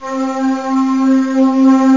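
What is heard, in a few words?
synth strings; gmplay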